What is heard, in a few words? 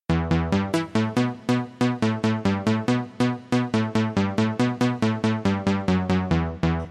ringtone